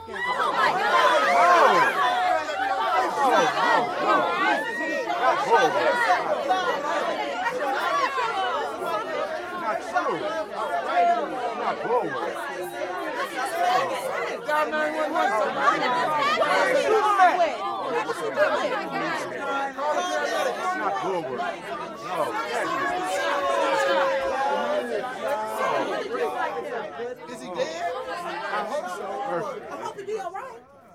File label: AIRPLANE JET F16 PASS OVER; Blind Drive